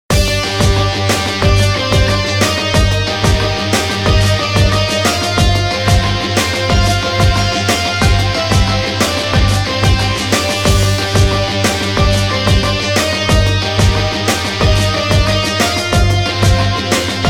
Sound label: ringtones; Sony Ericsson W595; Rock on